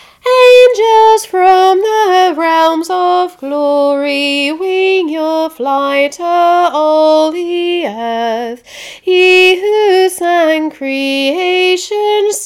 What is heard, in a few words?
angels alto, Christmas Choirs